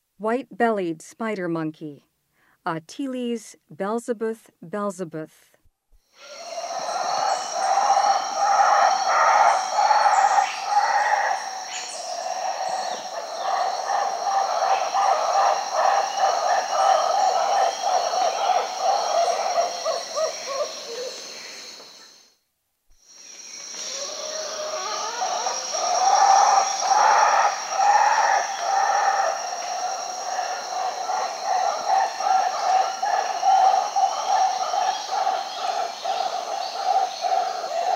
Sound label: White-bellied spider monkey; S. America; Rainforest